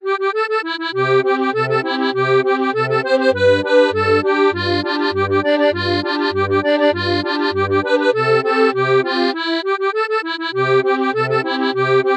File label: folk